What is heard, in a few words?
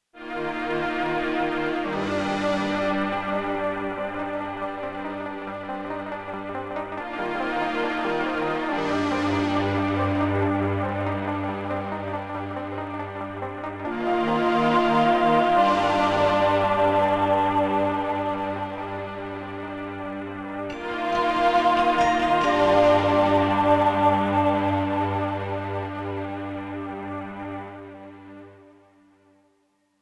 Day Amb Stinger, gamerip; dying light